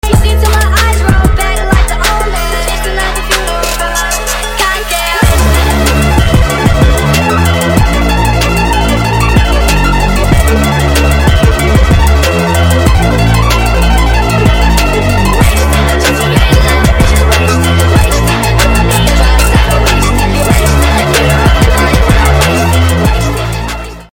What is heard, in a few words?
nightcore bgm ringtone beats